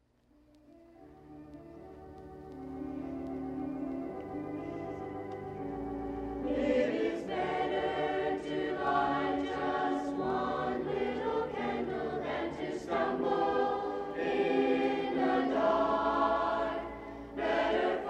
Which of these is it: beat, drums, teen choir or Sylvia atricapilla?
teen choir